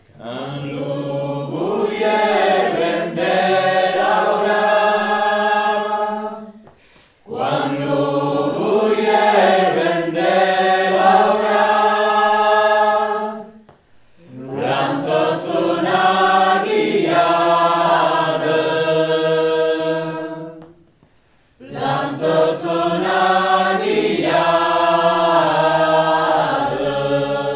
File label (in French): voix haute; Sarrazineurs; Lo Boier